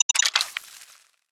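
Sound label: weapon mine disarm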